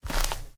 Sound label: grass, step; STALKER; minecraft